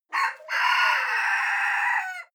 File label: cock call hoarse distant